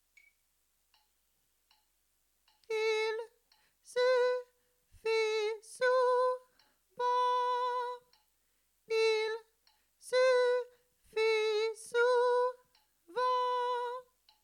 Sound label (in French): canon jazz voix 4